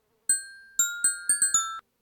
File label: Soaring eagle